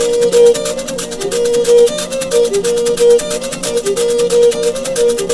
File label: PYGMY LOOP; Zero G - Ethnic